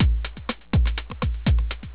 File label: Loop